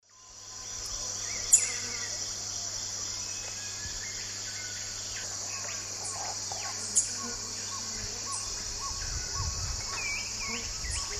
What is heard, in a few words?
Chlorophanes spiza, calls; S. America; Eastern Lowlands